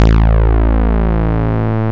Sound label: DIGI BASS; DIGI BS